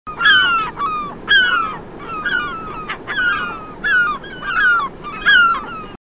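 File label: serebristaya chayka voice, herring gull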